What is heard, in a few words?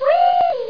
weee; Robocrsh